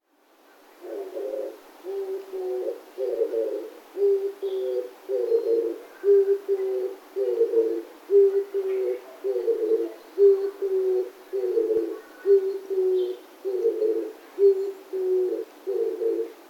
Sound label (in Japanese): キジバト, さいたま市